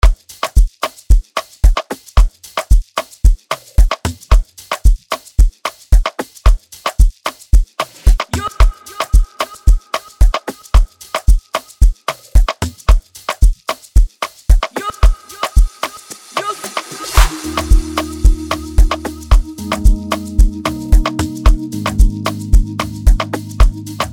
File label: Freebeat Amapiano Type Beat; Prod By Yussy